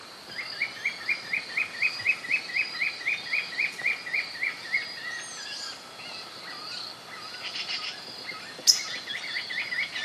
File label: Spotted puffbird